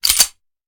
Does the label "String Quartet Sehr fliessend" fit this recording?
No